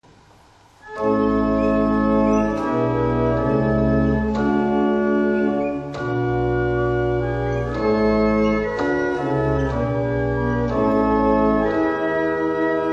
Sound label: Double Chant, barrel organ; Tittleshall; Dupuis